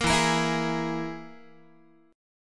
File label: E Major Flat 5th, Guitar; strummed; JGuitar